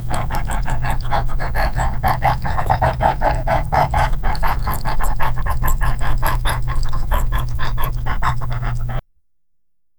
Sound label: dogs tail moving sound, pet